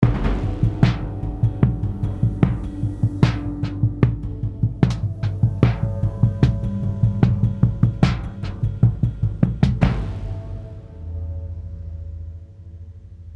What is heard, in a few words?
Drums Metal Harp Hits End Tag